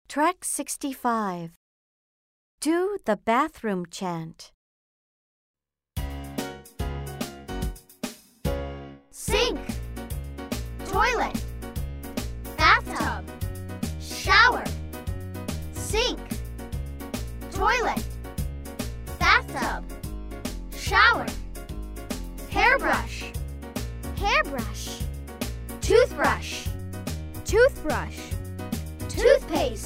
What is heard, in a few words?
the bathroom chant